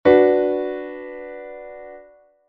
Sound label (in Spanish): perfecto menor